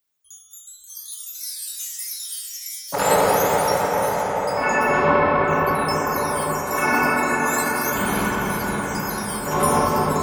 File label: Windchimes and Disco